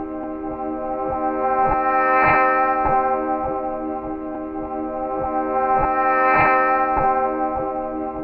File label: harmonic loop low; LOOPING AMBIENCE